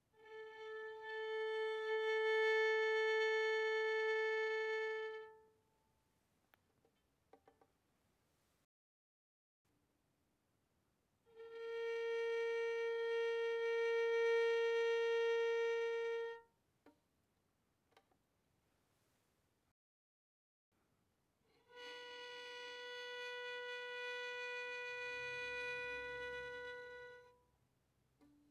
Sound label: Violin arco pp sul A A4 B4